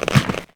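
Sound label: armor heavy; The Elder Scrolls III - Morrowind; Morrowind GOTY GOG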